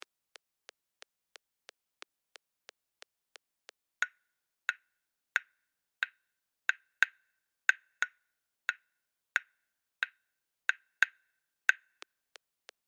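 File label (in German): Djabara 90bpm, Afrikanische Rhythmen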